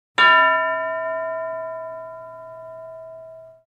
Large Low Pitched Bell Struck For Boxing Or Carnival